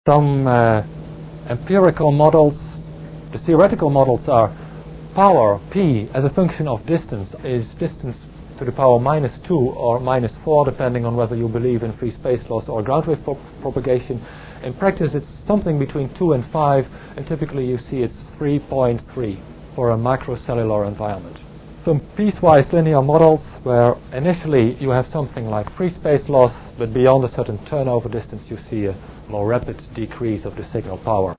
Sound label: prop, audio